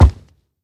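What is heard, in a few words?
hoglin step, 疣猪兽：脚步声; Minecraft; 疣猪兽是一种生成在绯红森林的攻击型生物。它们是目前唯一可繁殖的攻击型生物。; 疣猪兽（我的世界）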